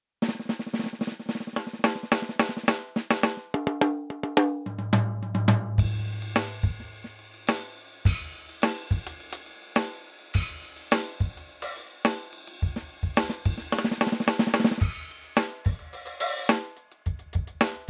EFNOTE Mini 4 Street Groove Kit

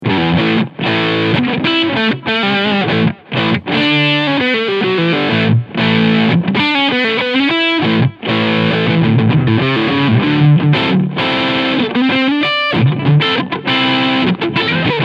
SC 550 SL Tribute Crunch beide PUs; Harley Benton

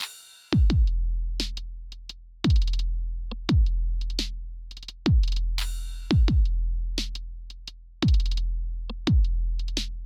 Channelstrip Punchy; Metric Halo v4; Drumazone2

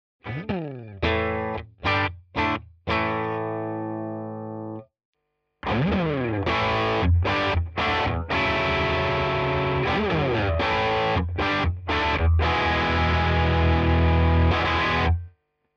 Fundamental DRIVE Crunch; Walrus

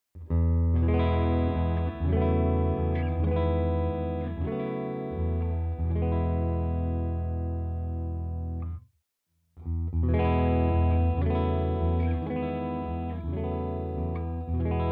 clean Chords all positions; Schecter Vintage PT Fastback II B

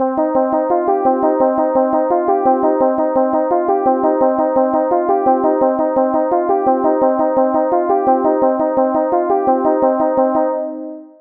Arpeggio

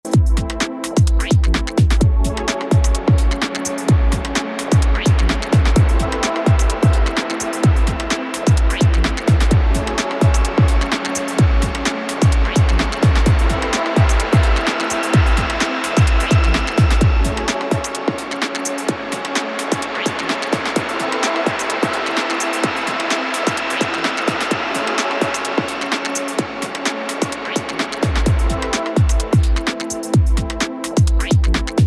Shimmer

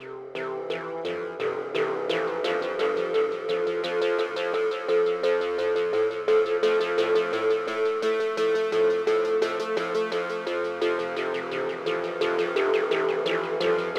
MS 20 Dirty Delay Ping Pong; Metric Halo v4

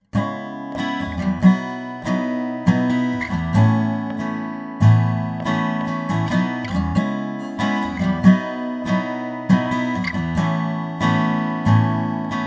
ibanez aam700ce nt strumming contact pickup

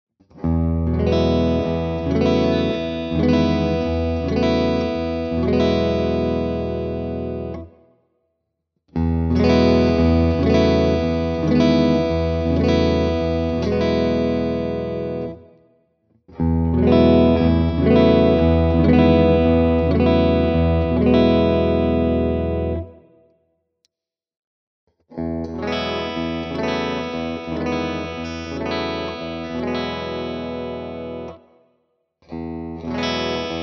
Ibanez AR520HFM clean all positions Chords

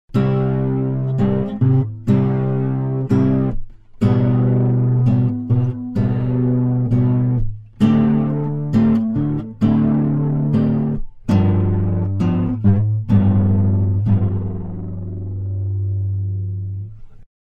LoFi Tape Spread; EFX Refract

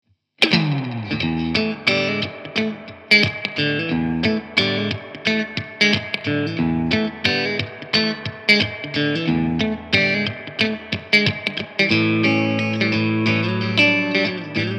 Mesa Boogie Fillmore 50 Combo Reverb

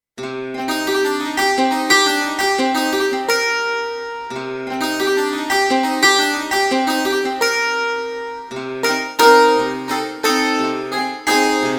Dulcimer Rich Plate

